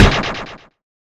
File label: high kick toe hit; HeavenStudio; space Soccer